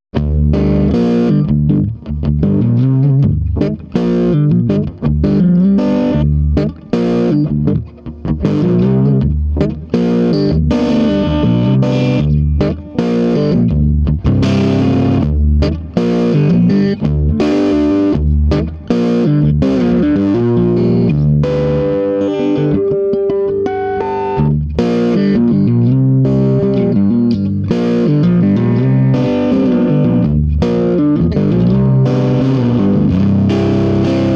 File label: Swart Amp; Swart Atomic Space Tone